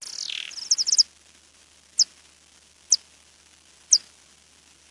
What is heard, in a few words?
Yuma Myotis feeding buzz; MosesCoulee